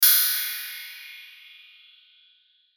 Hit Metallic Warble Reverb Ascend; Metallic Sound; Blastwave

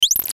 Ascend Chirp Sputter; Blastwave

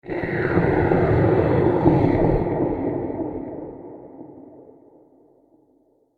Creepy Absorptive Transition With Delays; Scary Soundscape; Crafted Signals

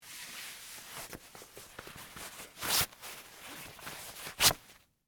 Karate Belt Tie, Sports, Karate, Belt, Tie; Miscellaneous Sports

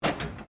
PINBALL MODERN FLIPPER, Modern pinball table; Arcades

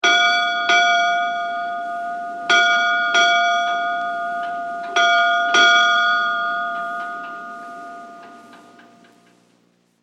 Ship Bell Six Rings; Boats; Vehicles

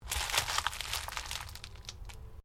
ORGANIC TWIST

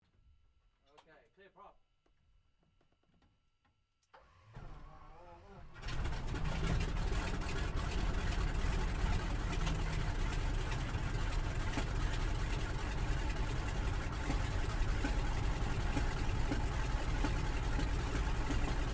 AIRCRAFT CAC WIRRAWAY STARTUP INTERIOR STEREO; CA-16 Wirraway; Airplanes

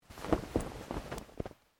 Clothing Shirt Cotton Move

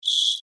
Insect Voice Chirp, Vocalization, Bug; Animal

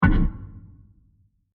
Massive Diffuse Hit, drum; Drums; Musical; Crafted Signals

